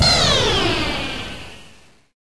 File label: bumper car speed boost stop; tf